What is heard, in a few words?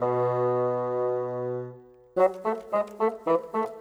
Bassoon, Orchestral; Sonic Foundry (Sony Creative Software); Orchestral VOL-2; Modern 10